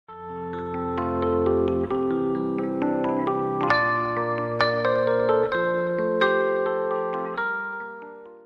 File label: electric piano